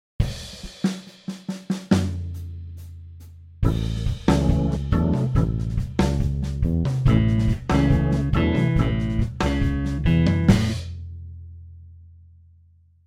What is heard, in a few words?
Blues Rumba guitar